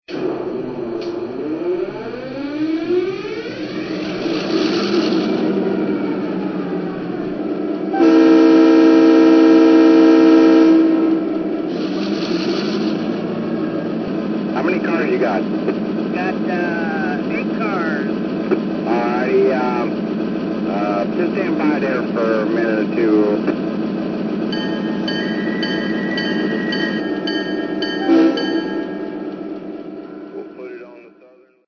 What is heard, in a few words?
GP38-2